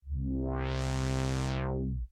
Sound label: Synth filter sweep